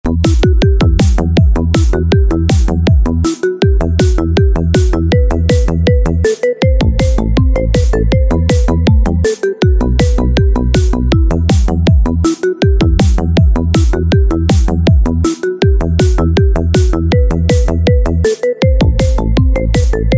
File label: Bella Ciao Best Instrumental Music Ringtone; La Casa De Papel